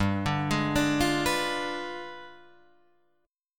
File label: G 7th Flat 9th G, Guitar Drop D